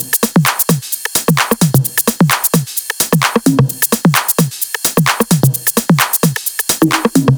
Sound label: 130BPM Kit; Pressure 1 Kit